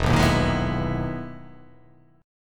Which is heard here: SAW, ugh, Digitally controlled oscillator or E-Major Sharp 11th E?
E-Major Sharp 11th E